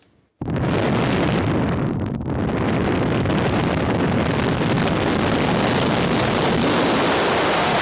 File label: wind sound effect